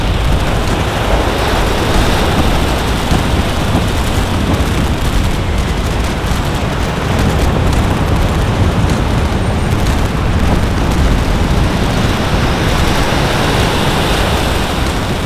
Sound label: dday battle; WAR